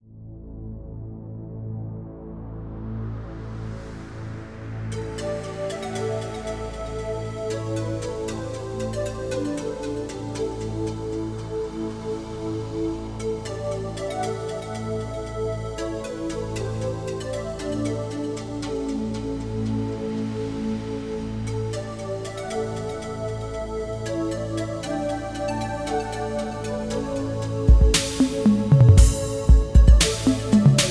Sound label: Analog Drums